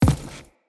Crew Footstep Default; Railjack Crew; Steel Meridian

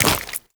Land Flesh, Footsteps; Warframe; Tenno

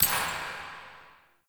Menu Color Reset, UI; Warframe; Awakening Remaster

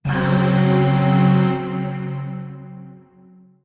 Void Shawzin Hirajoshi Chord; Warframe; Zariman Void Shawzin

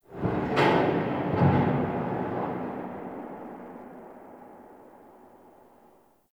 Parking Lot Distant Metal Bang; Ambience; Warframe; NineteenNinetyNine

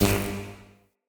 Ambient Tesla Rod Zap; Encounters; Ode Pollution Tower

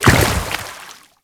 Grineer Step Water, Footsteps; Enemies; Warframe

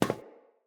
Friendly NPC Step Default; Characters; Footsteps

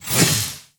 scythe whoosh small; Weapons; Melee; Warframe; Archwing; Exalted Archwing Scythe

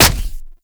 Punch Impact Flesh; Warframe; Infested; Kick Punch

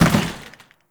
Janitor Cart Impact Short; Ambience; Gameplay; Warframe; Nineteen Ninety Nine

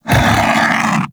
Vocal Pain; Hunting Critters; Undazoa

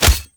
Unarmed Tempest Punch Impact Default; Weapon Impacts; Combat

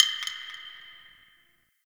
Interior Distant Marble Hit; Warframe; Ambience; Nineteen Ninety Nine

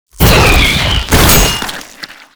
Volatile Runner Kamikaze Flesh; Warframe; Proto Infested; Abilities

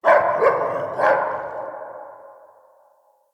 Pack Wolf Appear Bark; Animals

